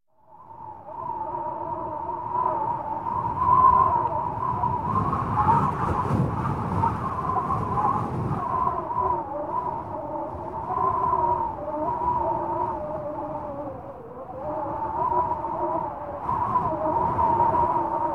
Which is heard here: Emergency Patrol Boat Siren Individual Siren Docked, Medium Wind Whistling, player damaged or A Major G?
Medium Wind Whistling